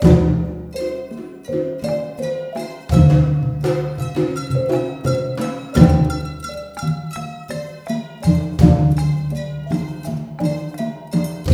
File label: MALAY R, Heart of Asia